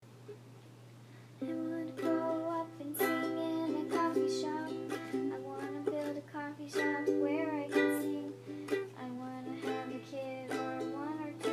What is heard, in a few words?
Singing